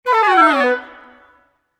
Woodwind run B7 (for E maj); PowerFX; Downtown Orchestra; Runs

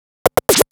Scratch Fills 140BPM

